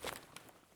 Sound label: beacon equip; Escape from Tarkov